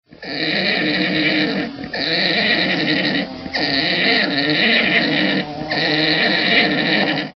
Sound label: orgle